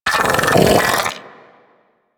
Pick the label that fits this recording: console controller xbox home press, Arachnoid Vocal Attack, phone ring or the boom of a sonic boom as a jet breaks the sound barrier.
Arachnoid Vocal Attack